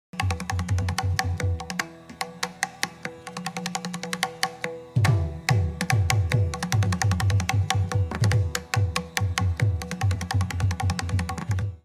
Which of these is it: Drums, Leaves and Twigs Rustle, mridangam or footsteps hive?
mridangam